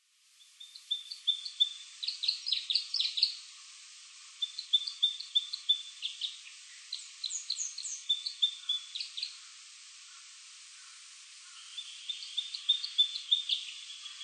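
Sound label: Red Crossbill; isuka; shimokita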